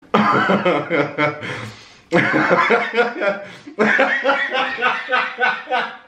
laughing out loud

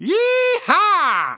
yeeha; woody; toy story game

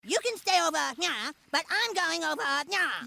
nyuh

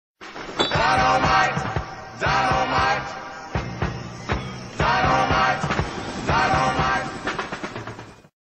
jingle

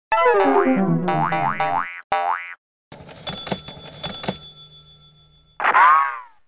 game over; donald duck; goin quackers

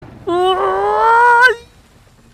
uuuuuuhhhhh

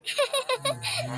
cute giggle

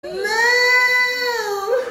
mooooo uh

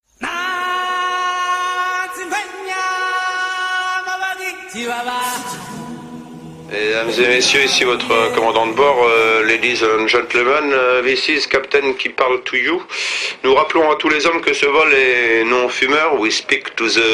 jingle

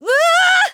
ko sound; ssbu mythra

